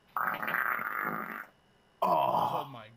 farting while gaming

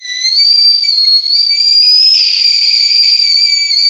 extremely painful sound

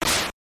monk punch

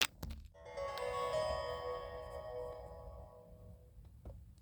subaru welcome chime

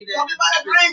capybara drink

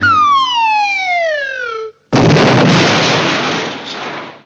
cartoon slide whistle crash

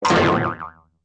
boi yoi yoi yoi yoing and drum hit

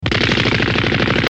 rapid fire

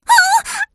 yelping; amy rose

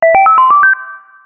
1up; mario galaxy; wii speaker

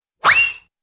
whistle streak out

